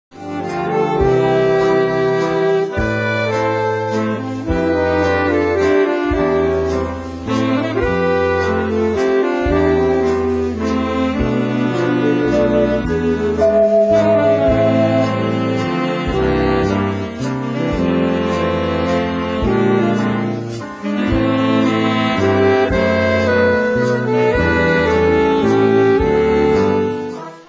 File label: Saxes